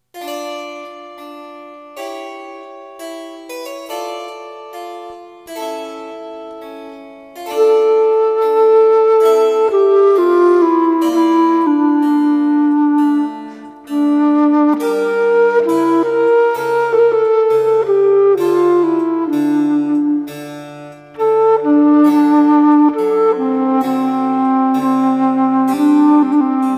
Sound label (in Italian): contralto adagio, querfloete; vivaldi